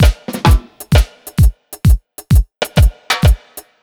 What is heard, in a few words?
130 jam, Ragga & Dub Reggae; USB Soundscan vol.27; AKAI